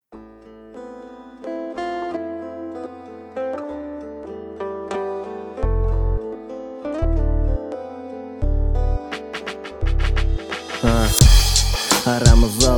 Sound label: solo